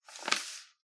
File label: shuffling papers